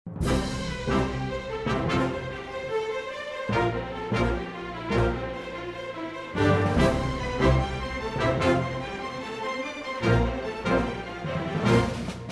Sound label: Music; Age of Empires III